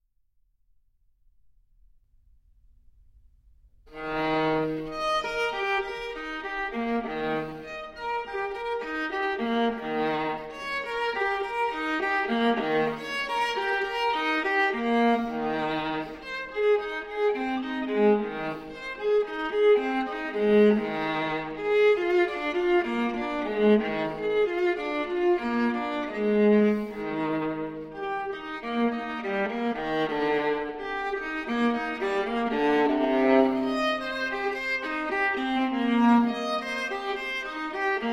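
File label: Cello Suite No. 4 in E-Flat Major Transcription For Viola Prélude; J.S. Bach; BWV 1010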